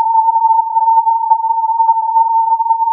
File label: filtered input